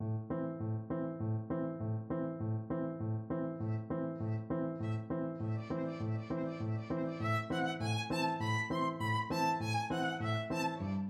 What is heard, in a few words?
violin duet